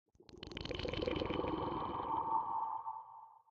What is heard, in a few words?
conduit; minecraft 1.21.5; block